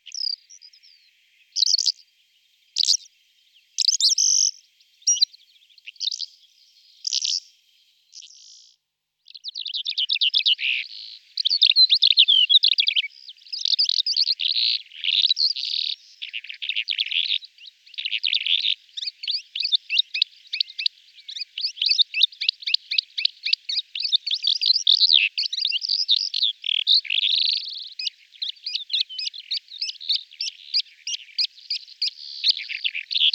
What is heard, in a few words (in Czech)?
Kalandra zpevna Melanocorypha calandra, nasiptaci